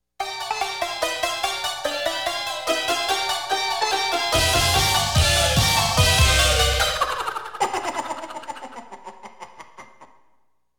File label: Game Over